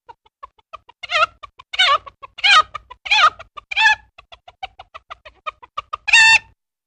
Funny, Single Chicken Squawks ( I.e. Laying Eggs ).